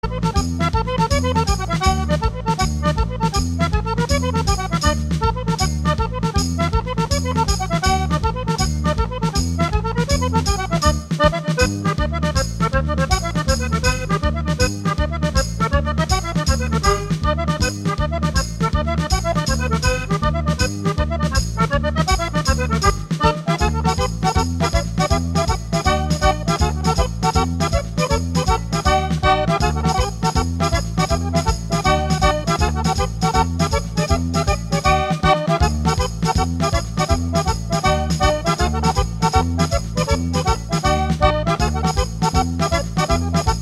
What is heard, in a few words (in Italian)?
Quadriglia Abruzzese